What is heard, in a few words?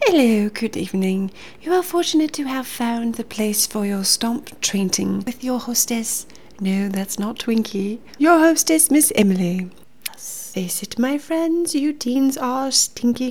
stomp